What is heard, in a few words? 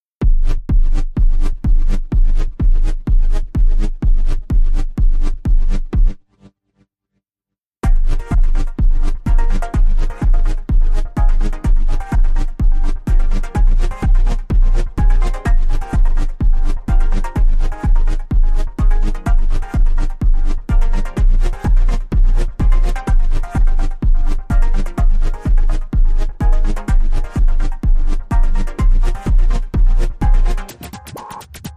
No Melody